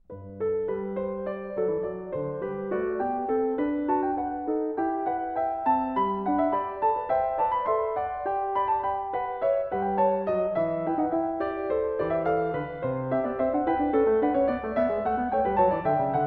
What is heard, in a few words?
Goldberg Variations, Canone alla Terza; Bach; The Goldberg Variations, Zenph Re-performance; BWV 988; Variation 9 a 1 Clav.